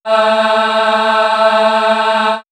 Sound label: Swirls Aaaaaaahhhhhh, pads; X-Static Goldmine